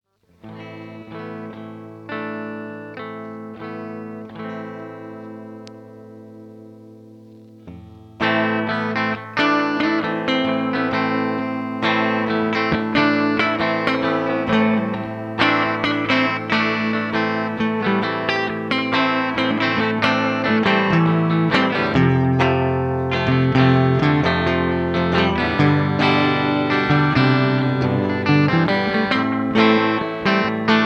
Reverb; circuit demos

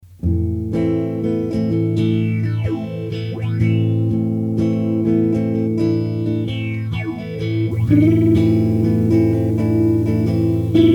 Opto Phase; circuit demos

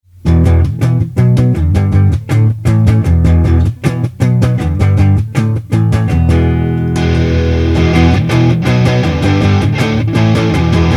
BY Plexi VS TS808; circuit demos

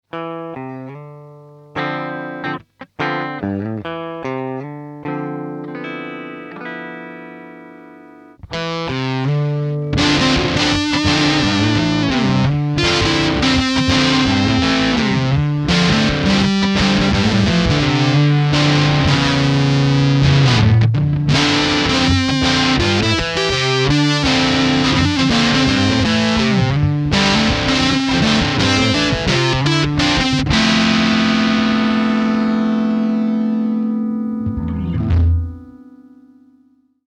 Fuzz; CremaFuzz; circuit demos

